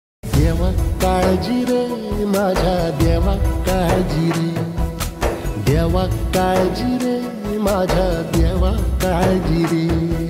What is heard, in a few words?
Gujarati Ringtone